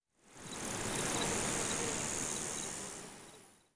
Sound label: CRICK, nature